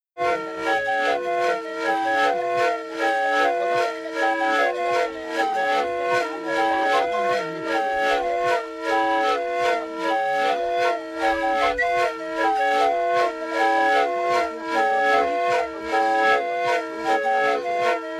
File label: urban tribal; Ragnar Johnsonが1971年にエチオピアでフィールド録音した音源集